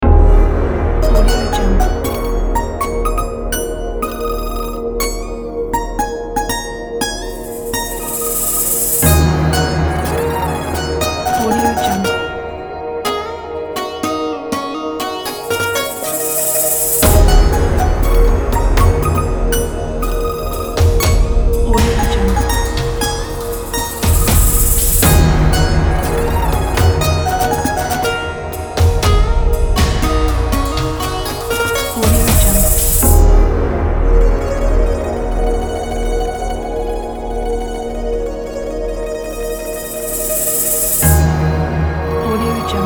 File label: asian cinematic